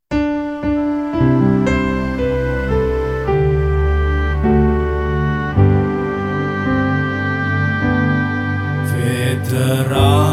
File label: hymne